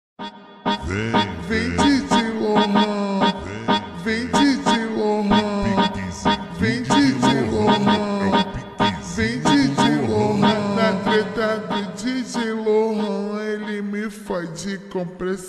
Phonk Slowed

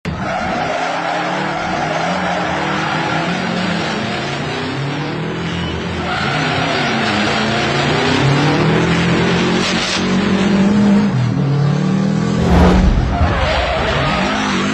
Drift Phonk

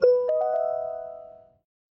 skyliner jingle; wdw; Disney

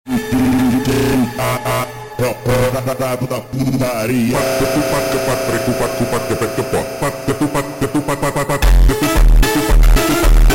slowed

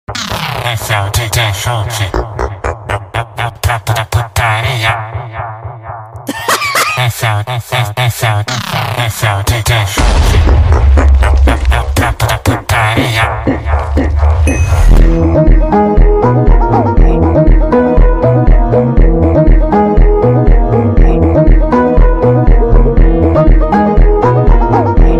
Funk

